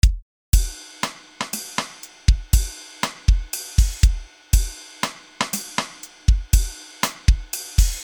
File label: loop 120 bpm